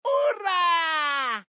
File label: Send Button; spongebob